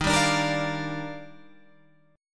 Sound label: D sharp Major 9th, Guitar Open G